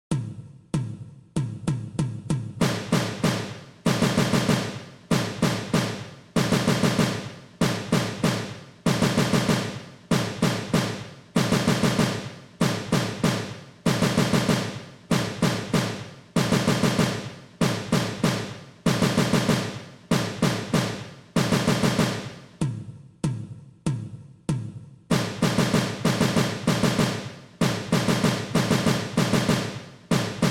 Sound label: Taps Diddles 96 bpm; percussion methods